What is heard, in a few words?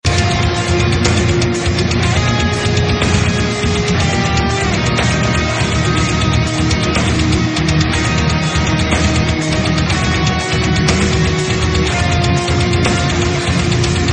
Sherlock Metal